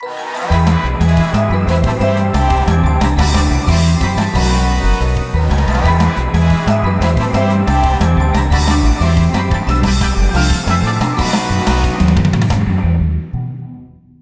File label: Home Goal (Fanfare); super mario strikers gamecube